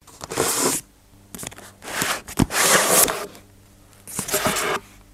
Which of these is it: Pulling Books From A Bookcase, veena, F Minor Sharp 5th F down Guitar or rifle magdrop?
Pulling Books From A Bookcase